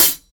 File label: treble heavy hi hat f key; hat samples